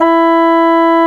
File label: Bassoon G#2